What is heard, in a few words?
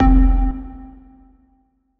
Synth Pluck